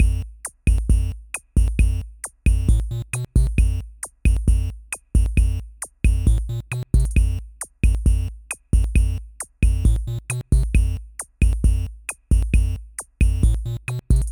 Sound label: Arcade Game Series bgm menu loop; ps4 xbox one gamerip 2016; Pac-Man Championship Edition 2